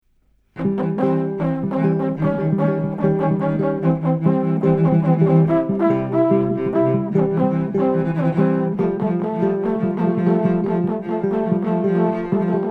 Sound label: morse choral